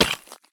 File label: sprint tile; Escape from Tarkov